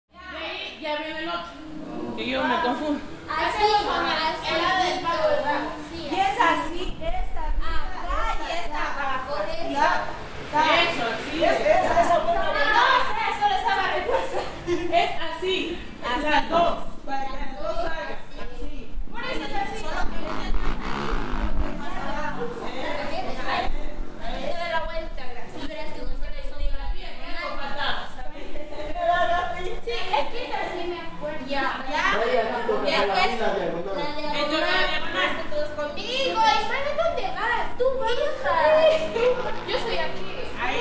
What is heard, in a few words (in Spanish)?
ensayo paisaje sonoro calle; yowa; palenque yowa